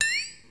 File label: rollover; SpongeBob Diner Dash